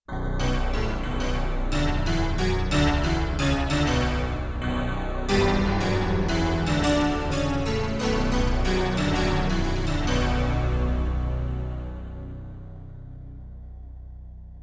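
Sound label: Metal-Clavinet-Unisono, Yamaha TX16W; Spacey Keys 2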